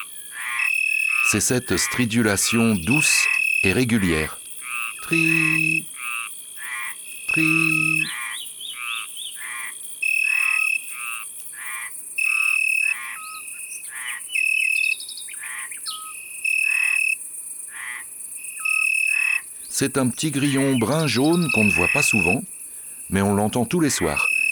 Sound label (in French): Grillon d Italie; abc de la nature